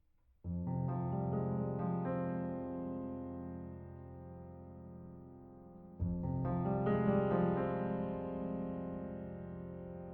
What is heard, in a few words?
Piano